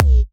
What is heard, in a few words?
Kick